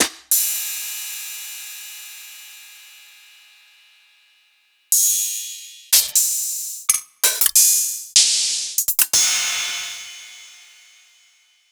808 trap HiHat

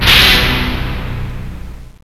HIT + STRINGS; Kid Nepro - Elite

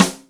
Sticky, Yamaha DTXpress Acoustic Snare

